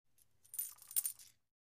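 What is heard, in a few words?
Coins Hand Coin Movement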